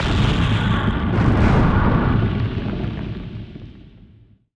Frenzied Firing And Hitting

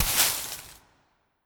Broom

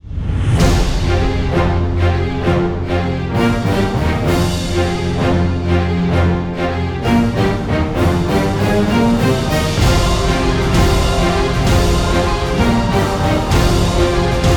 Theme Pirate; Monster Jam Steel Titans

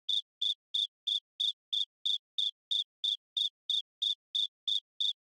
Env Bug Cicada Loop

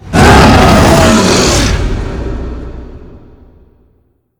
Monster Evolving 1st Evolution; Monster George

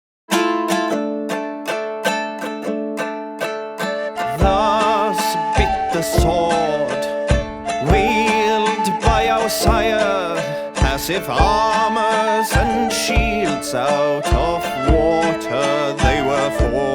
kitara+vocal+drum+flute

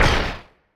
Small Rocket

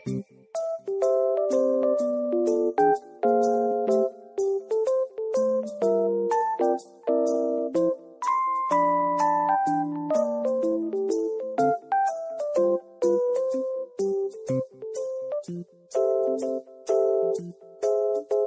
BGM Mukbang

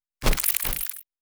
Fly Dodge; Mars Sortie (Arcade) (gamerip) (2018)

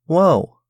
Chorus surprise; Frogsong

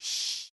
Shh; Dino Donkey Dash

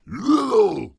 Pirate Barrel; rabbids multiverse; iOS, macOS, Mobile

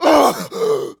Male Die; Mars Sortie

